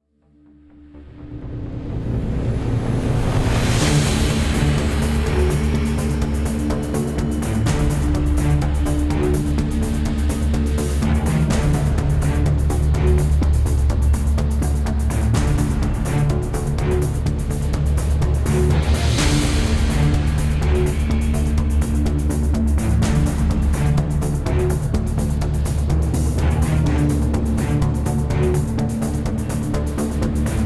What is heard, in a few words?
Swarm Fight; mass effect 2